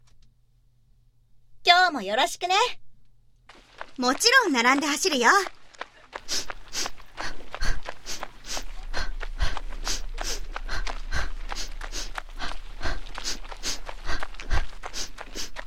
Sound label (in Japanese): ランニング